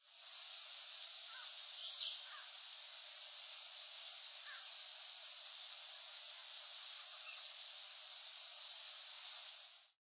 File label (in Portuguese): beija-flor-roxo